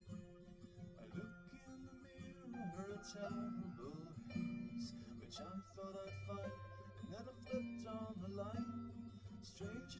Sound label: nodrums liverec 1m; SASSEC07